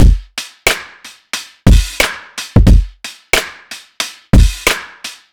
Rimshot

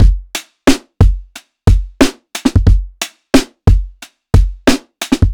Soft Snare

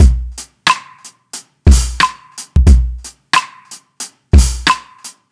Rimshot

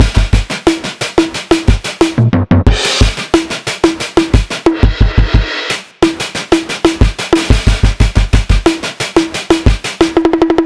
Finger Drumming

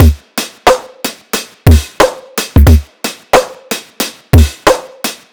Rimshot

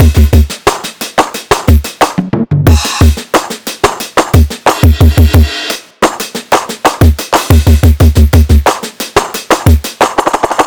Finger Drumming

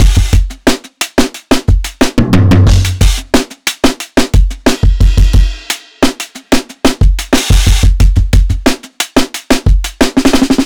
Soft Finger Drumming

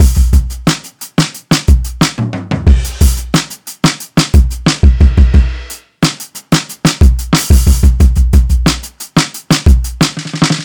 Finger Drumming

